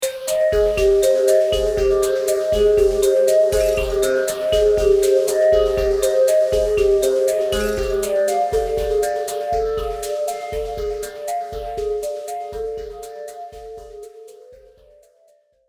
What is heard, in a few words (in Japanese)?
ミステリー・ホラー・探索パート 不穏な感じムンムン ショート フェードアウト